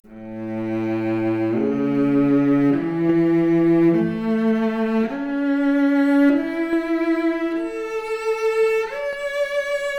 Violoncello arp, mp Stereo output noised, 3bit

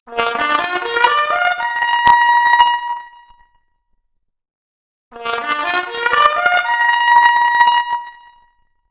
Trumpet arp, Stereo output noised; 3bit

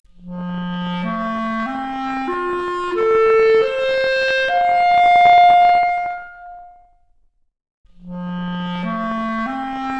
Clarinet arp, Stereo output noised; 3bit

